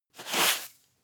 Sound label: broom sweep hard